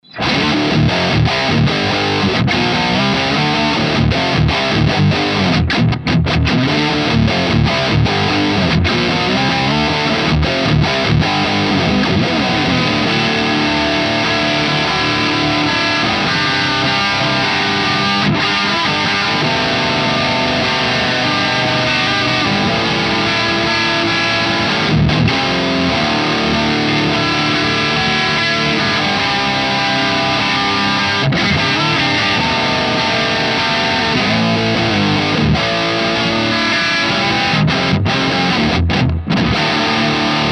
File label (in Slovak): Dual Diody Modern 2 kanal